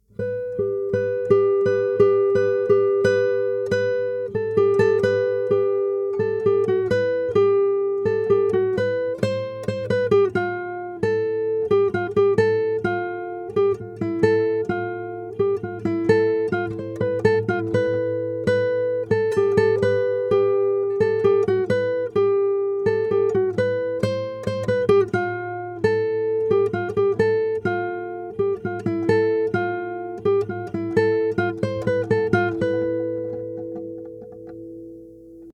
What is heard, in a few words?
tempo 105